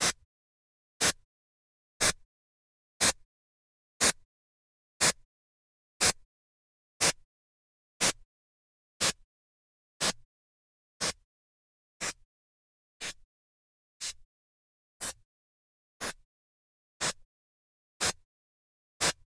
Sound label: blumlein, noiseburst